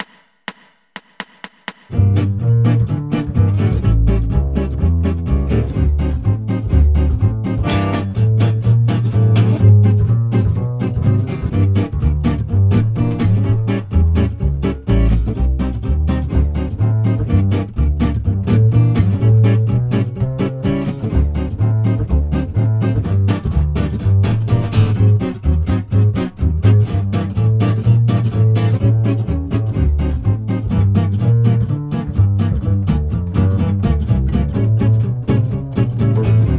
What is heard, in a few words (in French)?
swing gitan